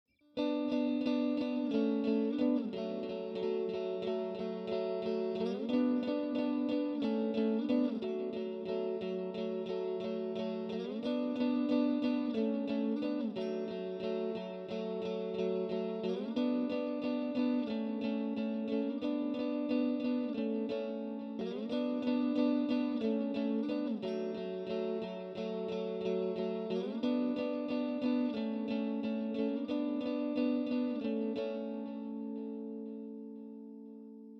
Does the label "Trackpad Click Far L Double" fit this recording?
No